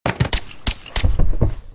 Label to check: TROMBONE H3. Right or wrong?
Wrong